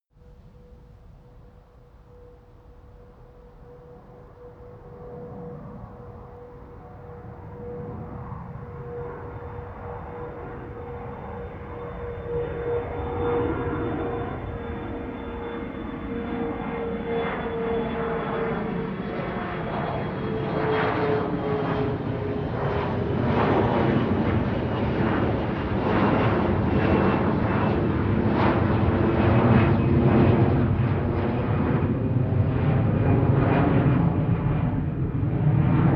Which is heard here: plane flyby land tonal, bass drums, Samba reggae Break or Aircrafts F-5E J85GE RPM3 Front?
plane flyby land tonal